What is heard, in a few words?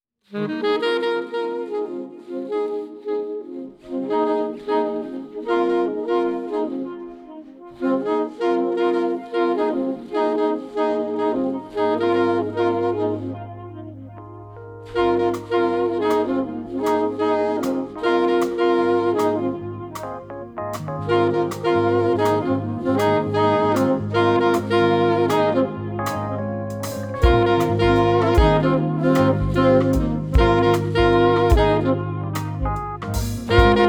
slow jam